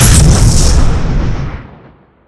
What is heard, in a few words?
fire, weapons; cguard